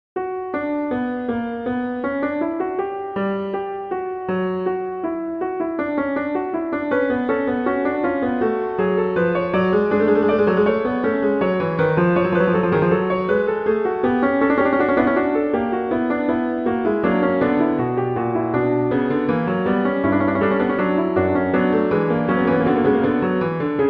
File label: Fugue; Bach; Prelude Fugue XXIV